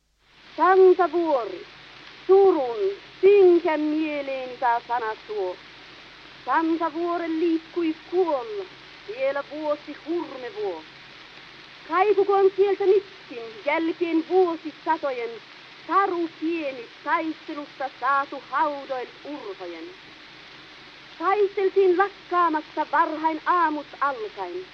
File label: Gramophone